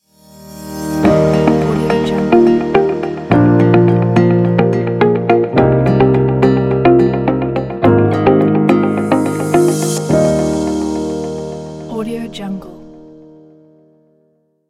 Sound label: sting for logo